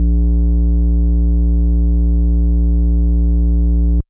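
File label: FM Sq Dist DX100 A#1; square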